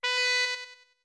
Notes Pipes B med

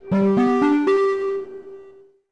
Signal; Swamp Cruise

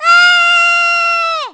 Jump; Killer Bee #4 jumping

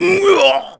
Parry Hit; Ganondorf; Zelda

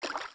Steps Shallow Water; Zelda; OOT

